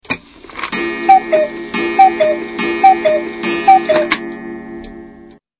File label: cuckoo clock